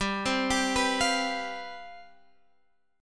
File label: C Major 7th Suspended 4th, G, guitar chord